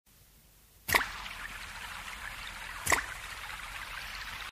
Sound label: Rain Msg Tone Wowwww